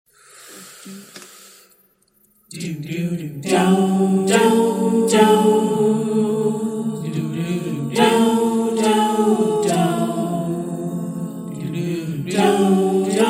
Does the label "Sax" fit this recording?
No